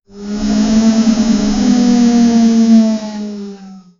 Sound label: the sound of a medieval, robotics